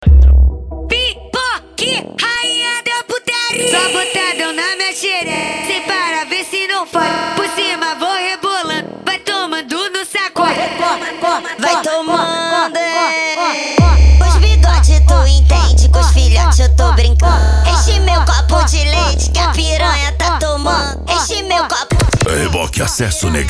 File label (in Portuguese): racha eletronica e funk mega bass